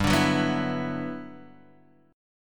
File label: G Major 13th, Guitar Half Step Down